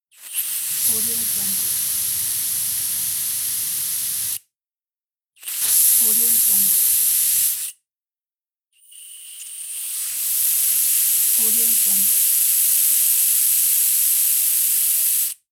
gas valve

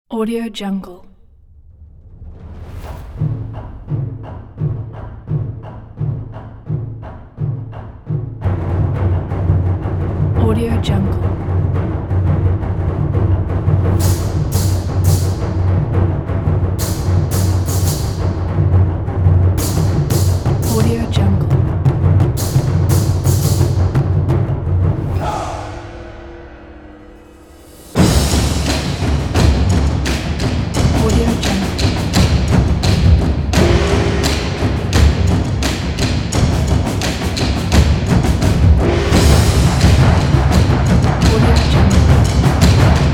taikos

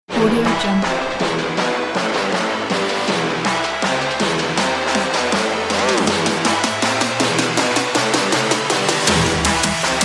the trap

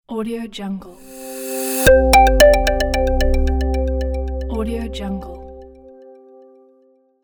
minimal logo

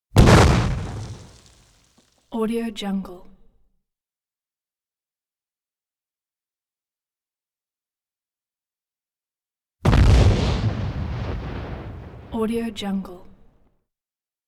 explosion big bang some debris reverberant

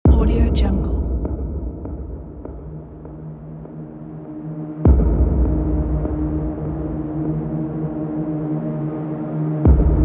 dark horror action trailer kit

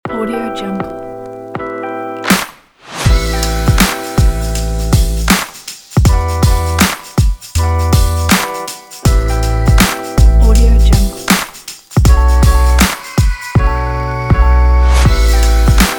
fashion hip hop

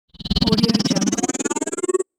magic doppelganger

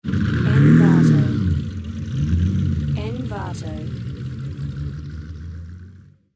gasoline engine antique italian

